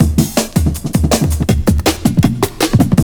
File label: JUNGL 160, JUNGLE LOOP